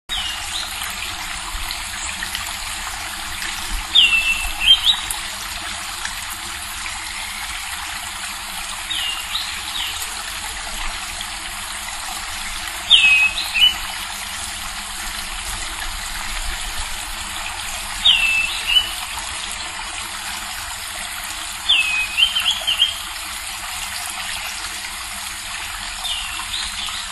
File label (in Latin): Schiffornis virescens; PP Cruce Caballero; Jorge La Grotteria